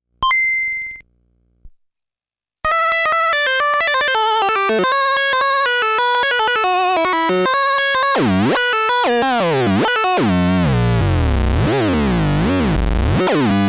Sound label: wave circuit bent gameboy noise